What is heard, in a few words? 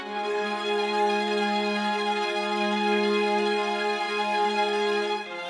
string sectio loop, 87 bpm